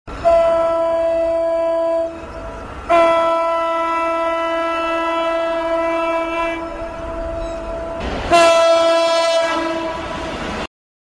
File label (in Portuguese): Buzina; Comboios